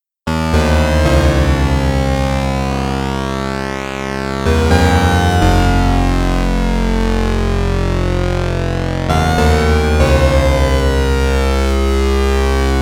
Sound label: Osc Sync Mono Unison